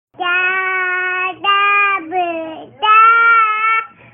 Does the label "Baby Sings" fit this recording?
Yes